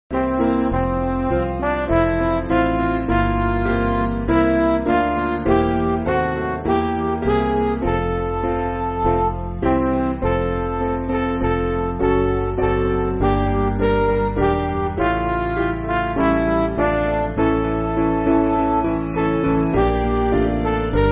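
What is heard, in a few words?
Midi, Music Backing Files for Churches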